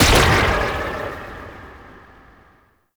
Hair Stomp Burst; Warframe; Monkey King

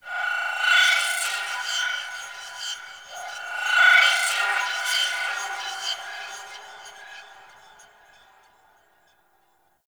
Grain Bird; Warframe; Tau

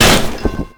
Umbrella Stand Destroy; Warframe; Ambience; Nineteen Ninety Nine

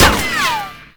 Tank Shield Impact; Enemies; Gameplay; Lasrian Tank; Warframe

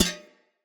Shield Foley Hit; Weapons; Enemy; Shields; Sinecure Shield

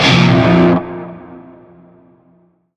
Shawzin Pent Min Chord A; Warframe; Narmer

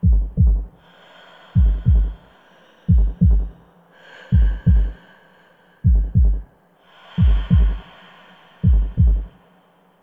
Last Grasp Loop; Warframe; Focus; Unairu

